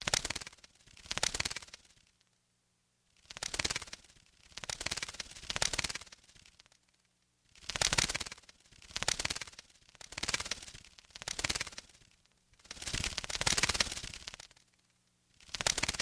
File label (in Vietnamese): am thanh cua mot con doi bay